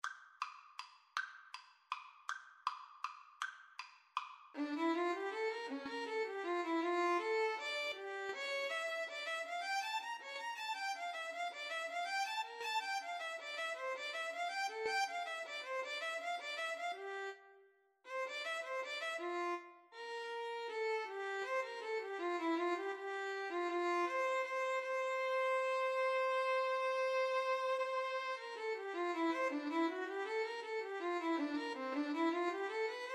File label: bach invention, Violin Cello Duet